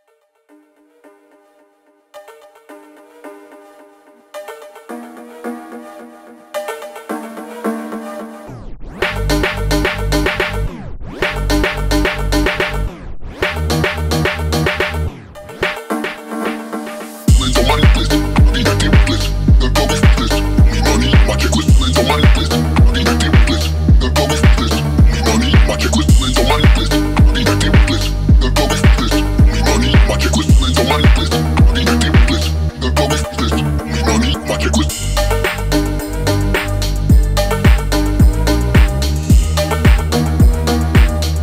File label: Retro Phonk Aggressive Phonk; Car Music